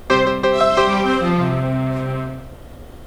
shutdown; T-Mobile

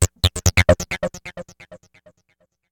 Bees Knees, notifications; Google; Android Open Source Project

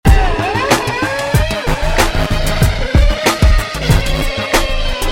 Funny; Google; NewWave Labs

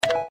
pound, Keytone sounds; LG KB620 Elbrus; System sounds; Typewriter

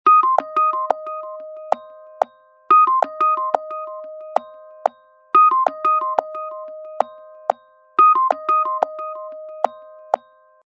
Clicky, Ringtone; HTC Freestyle

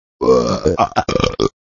Human Burps; Motorola E398; Hijacked by MTV

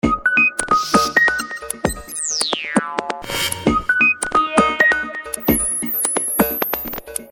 Crossing Tone; Samsung SCH-U450 Intensity

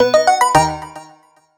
Full Alert; Sony Ericsson; C901; PlusFourSix; Lars Edvall

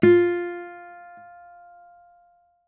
dial tone guitar; OnePlus One China

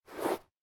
Sent Success; Tecno; Pop 9 4G

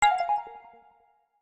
Space open; Nokia; Audio themes

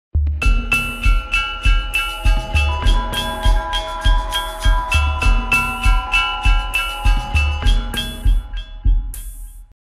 Clock (Gamelan); Windows Phone apps

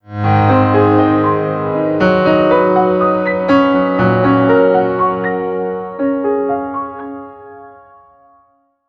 Burnish; HTC; alarms

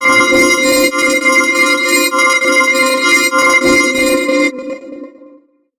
Silver Lining, ringtones

